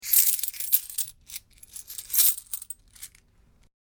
Money Coins; Money Sound Effects

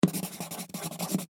Office Pencil Write On Cardboard; Writing (Sound Effects:Human)

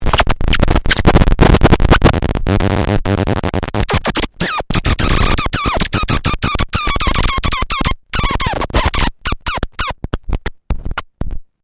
ELECTRONIC FEEDBACK UNUSUAL; Sound Design

